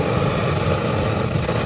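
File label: flamethrower, weapons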